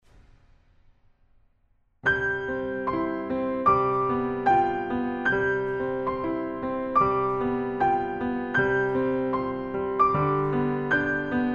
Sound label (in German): Das Weihnachtsgloeckchen; Mit Papa